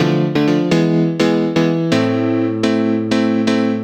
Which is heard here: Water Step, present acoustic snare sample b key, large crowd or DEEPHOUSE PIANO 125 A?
DEEPHOUSE PIANO 125 A